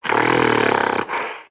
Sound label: atk idle, creatures organic